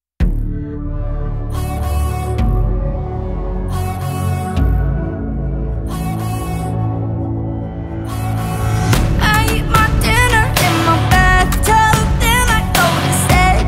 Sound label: rock version